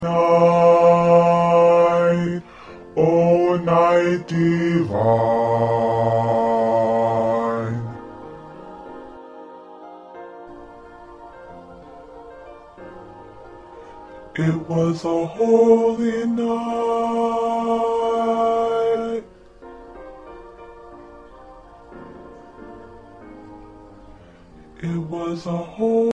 Bass

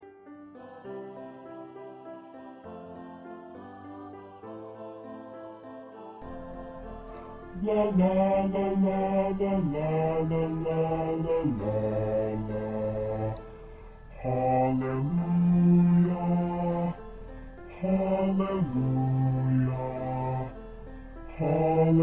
Bass

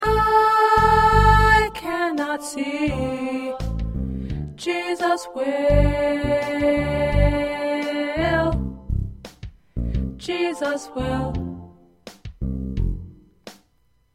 Tenor

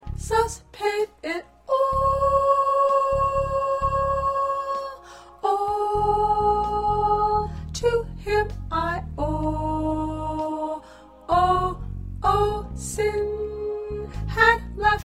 Soprano, choir

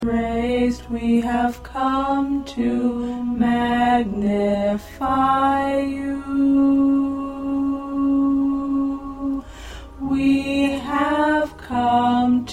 Tenor, choir

